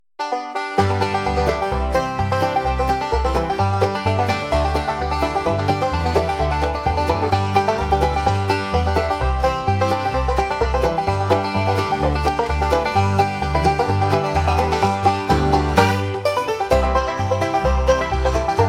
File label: banjo love